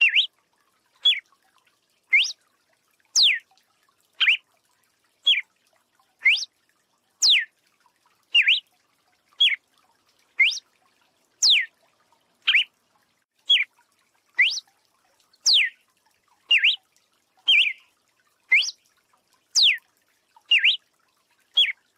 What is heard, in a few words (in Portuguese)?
galo de campina